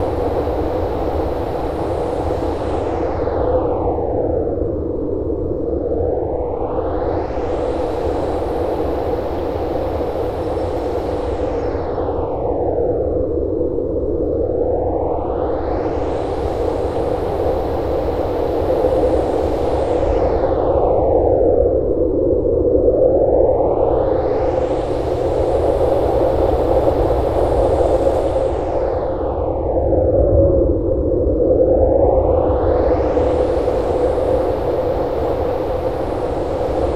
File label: wind